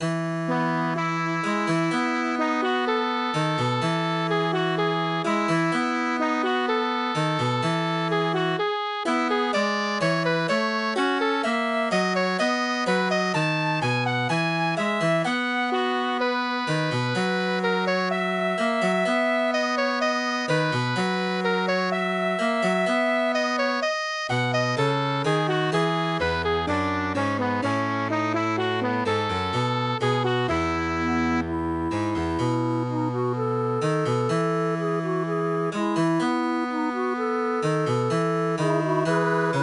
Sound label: MIDI